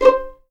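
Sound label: C5 pizzicato mezzo forte left, violins; East West; The Ultimate String Collection; VLNS PIZZ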